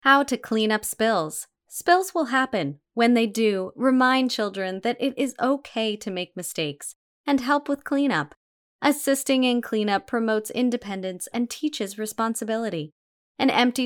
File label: clean up